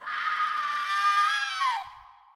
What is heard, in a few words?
female scream; Voice; Human